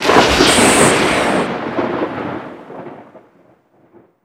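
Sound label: Thunder Crack Gun Shot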